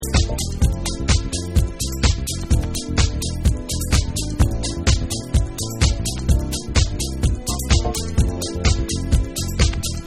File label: Classic Instrumental